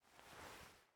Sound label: Switch Animation; raw game audio files; Mita; MiSide